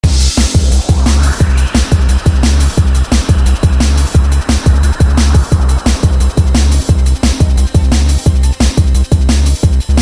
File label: Loop; Chaos Tower 1; Chaos Faction 2